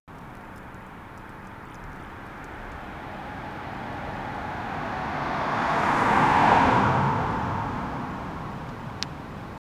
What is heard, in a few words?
vintage